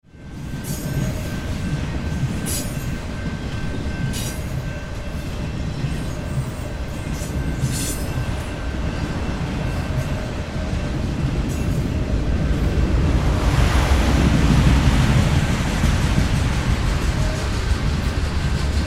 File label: Train Pass By; Transportation; Train Sound Effects; Trains (Sound Effects:Vehicles); Blastwave